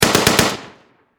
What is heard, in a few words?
Warfare Machine Gun 7.92 Caliber Mp44 Stg44 German Short Burst Distant Perspective